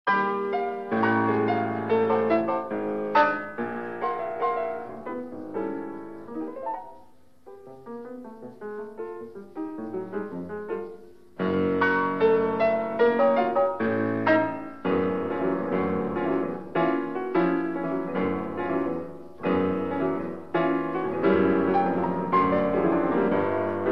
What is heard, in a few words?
Piano Rag Music; Piano Sonatas